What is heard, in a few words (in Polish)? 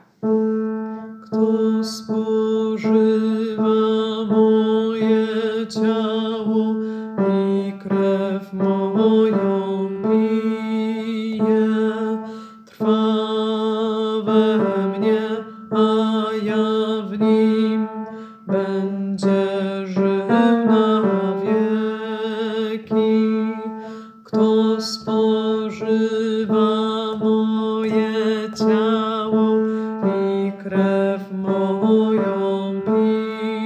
tenor; Kto spozywa moje Cialo